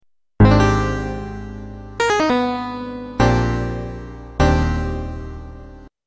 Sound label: Game Over